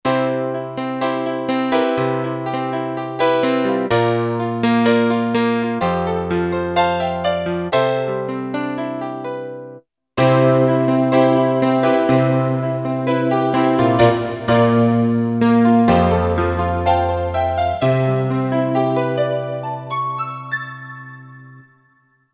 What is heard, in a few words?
BELL REVERB, Boss SX-700; Factory Preset